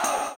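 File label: tambourine, 160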